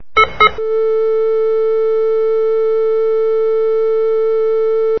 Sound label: Hospital Sound